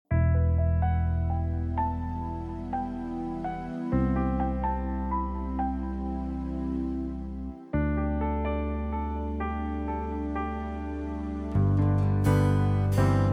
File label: G No Backing Vocals